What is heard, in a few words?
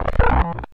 BLURP LO, Hard & Loud Techno; USB Soundscan vol.01; AKAI